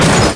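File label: car to wall; ARCADE; SRACER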